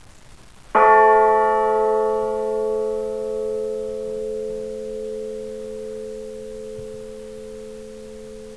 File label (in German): wildemann bronze a mono